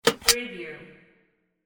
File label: button